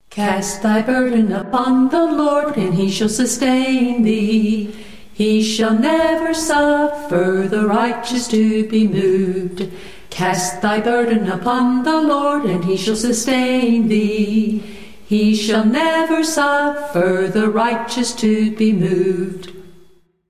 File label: a capella scripture song